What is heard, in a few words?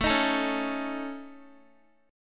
A Major Flat 5th, Guitar; x,12,15,14,13,x; AMb5 strummed